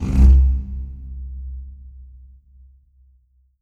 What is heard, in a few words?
Pipe Rub; Effects